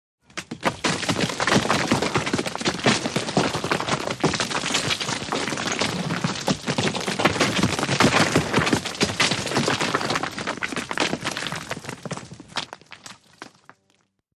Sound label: Rocks Sand Rock